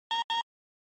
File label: Retro Anime System